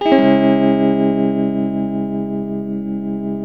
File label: TELTMUMIN D, TELE CHORDS, Guitars; Spectrasonics; Hans Zimmer Guitars VOL-1